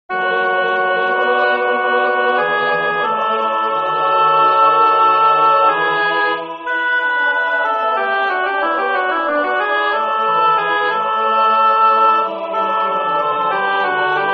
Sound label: Cum Sancto, Alto; Zelenka; MissaPaschalis